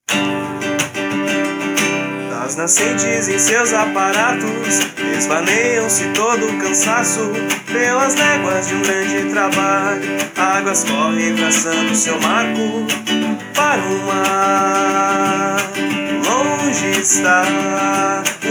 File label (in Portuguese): Jingle; Vencedor Nascentes e Aparatos